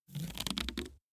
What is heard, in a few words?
bow load; minetest x bows